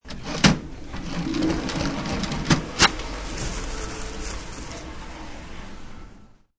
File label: Paper Towel Dispenser, Paper being ripped from a dispenser.; Bathroom; Household